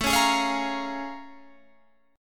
Bb Minor 7th A; Bbm7/A strummed

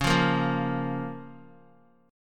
C sharp 6th, Guitar; strummed; 4F,4A,5C,5G,5C,6E; x,4,5,3,5,x; JGuitar

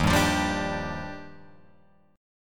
Eb Major 9th, Guitar Open F; EbM9 strummed; 3,2,5,2,5,2; JGuitar

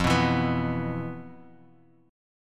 E 5th F; E5/F strummed; JGuitar

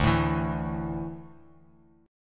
D Suspended 2nd D down Bass Standard, chord; strummed

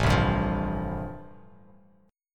A-Minor 7th Sharp 5th, Bass Standard, chord; Am7#5 strummed; JGuitar

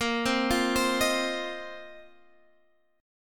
Bb Suspended 2nd Suspended 4th Bb

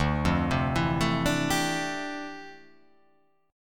D Minor 11th D down, guitar

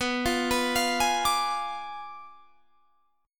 B Diminished 7th B down Guitar Standard; arpeggiated; JGuitar

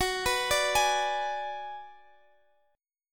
D 6th Flat 5th Gb, chord

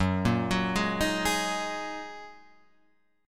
F sharp 6th Add 9th F sharp Guitar Half Step Down

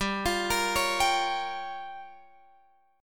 G Minor 7th Flat 5th, Guitar Open F; Gm7b5; arpeggiated

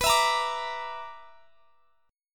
B-Minor Major 7th Double Flat 5th; BmM7bb5; strummed; x,x,21,21,23,22

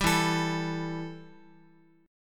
E Minor Double Flat 5th E, Guitar; strummed; JGuitar

